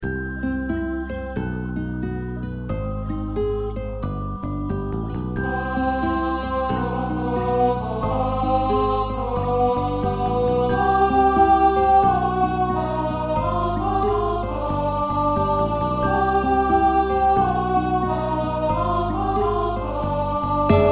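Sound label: Tenor, choir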